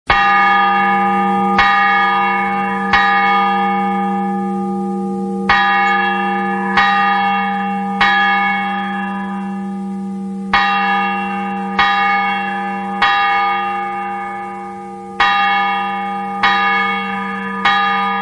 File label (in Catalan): missa, campanars tocs